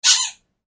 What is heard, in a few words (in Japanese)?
rappa; クイズ